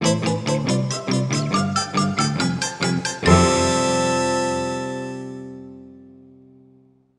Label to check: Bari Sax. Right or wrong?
Right